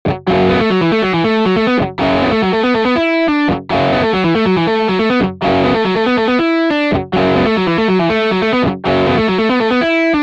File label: lespaul fx dist